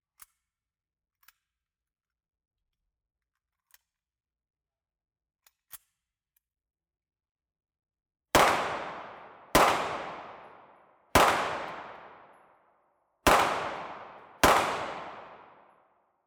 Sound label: load cock shoot 9mm pistol